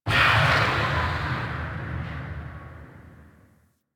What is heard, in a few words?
Ship Gun Battery Beam Void Burst Open; Warframe; Corpus Ship Remaster